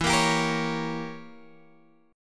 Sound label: E Diminished 7th E, Guitar Open F; Edim7; strummed